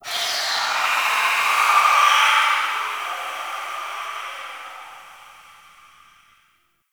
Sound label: Gas Steam Release; Warframe; Corpus Gas City